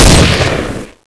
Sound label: akimbogun sg552 fire; weapons; ayakashi